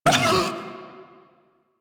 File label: Feral Vocal Pain; Enemies; Kubrow; Warframe